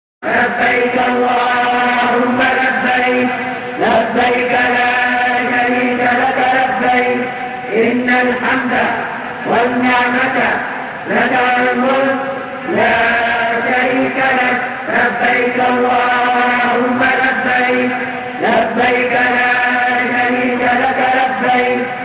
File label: Hajj Talbiyah Arabic